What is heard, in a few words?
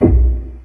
Misc Snare, Drum Machines